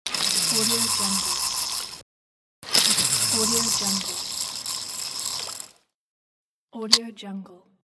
fishing reel sound pack

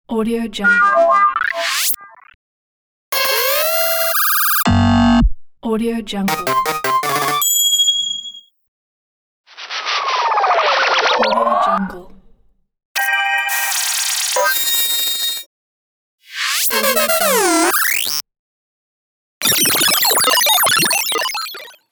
neo hybrid arcade

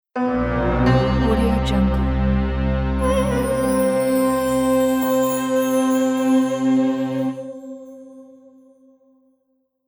oriental middle east logo

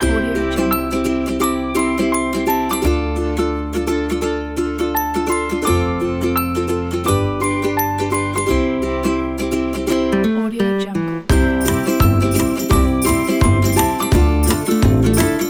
ukulele whistle bells folk pack; attractionofmusic

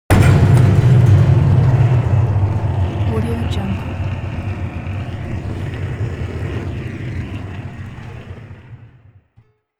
cinematic impact flames

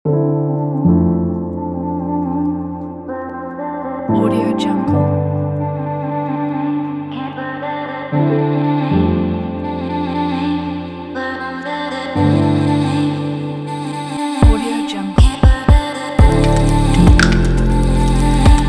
style kit

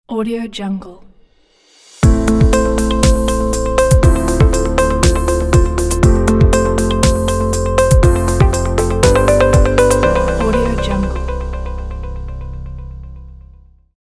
logo intro

